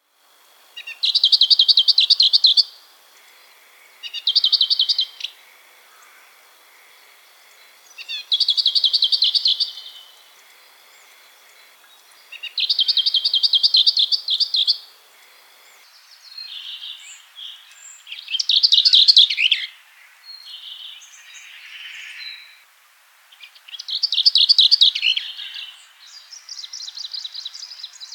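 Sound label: lesser whitethroat voice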